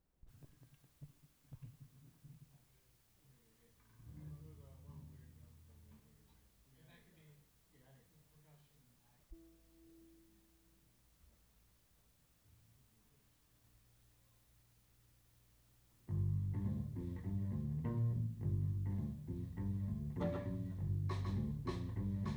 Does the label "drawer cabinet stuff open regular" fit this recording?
No